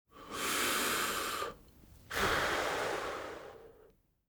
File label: Breathe In and Out; cigarman